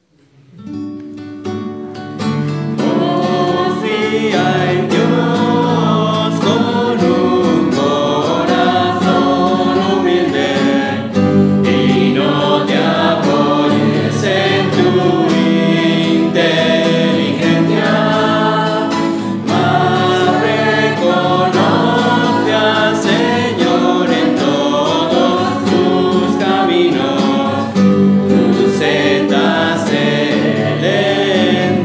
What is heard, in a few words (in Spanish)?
guitarra